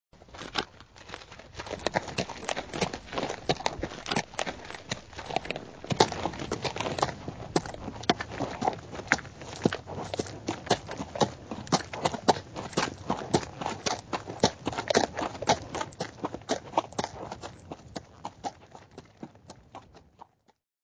Horse Walking On Dirt

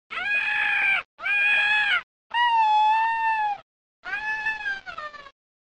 Women Cry

